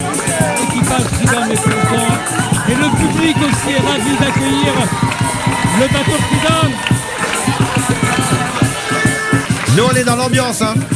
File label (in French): Ambiance folle arrivee bateau; Freedom; bateau reunionnais engage sur la Globe40